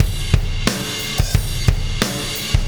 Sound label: Wormhole Beat, Processed Drumkits